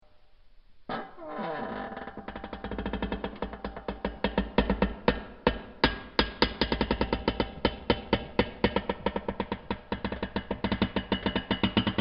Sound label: Giant Metal Creak, metal creaking; Objects